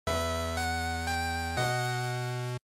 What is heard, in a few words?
GB RPG Game Over